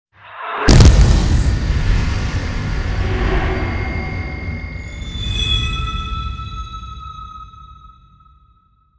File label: hit swell